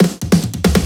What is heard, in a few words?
Riddim Drum Fill (140); Drum Fills; Ghosthack; Riddim Essentials 2020